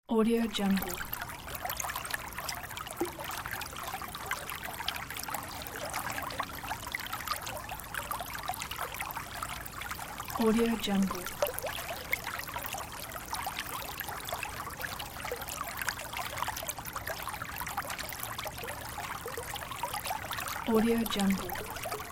water; fastfx

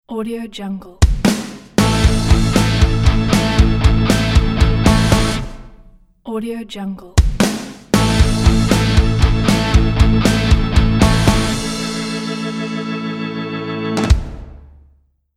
rock logo

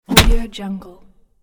punch

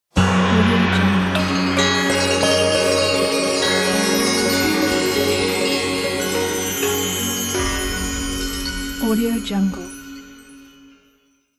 Chinese garden logo

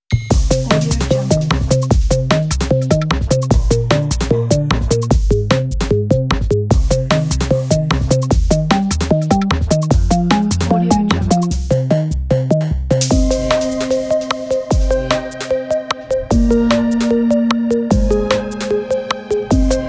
futuristic; crazytunes

